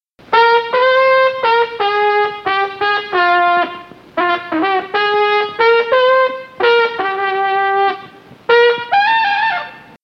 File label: high note trumpet